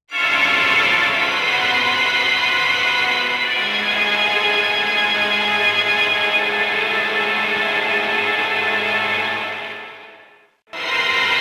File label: Horror Organ; The Darkness 2 gamerip 2012